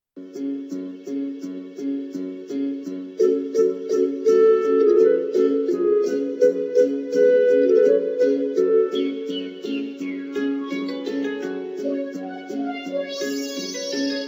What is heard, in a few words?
Ballet BGM